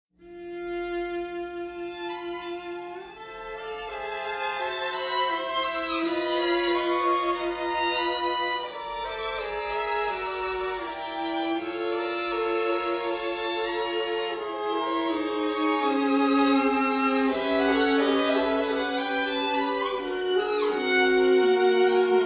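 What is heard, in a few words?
string quartet, Lento; Cuatro Miniaturas para Cuartetos de Cuerdas; musica latinoamericana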